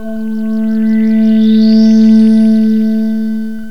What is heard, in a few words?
goblin, synth sfx